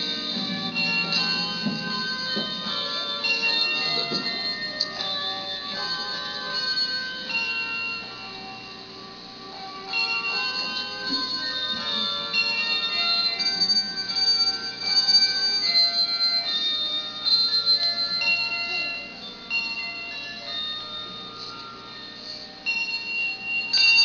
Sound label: Handbells, drumline; fhndrumline